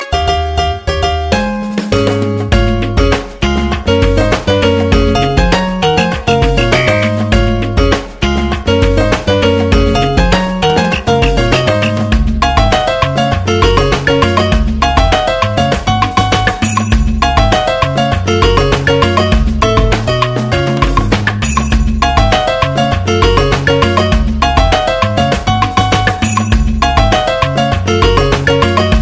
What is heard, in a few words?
Mario Ringtone